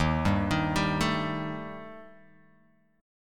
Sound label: D sharp Major Flat 5th, Guitar, Open F; D#Mb5 arpeggiated; 3,2,3,2,0,x; JGuitar